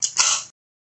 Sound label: the sounds of war guns sound fx; urban hollywood studios